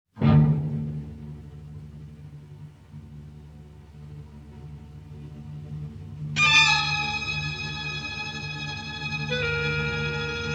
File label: violin scream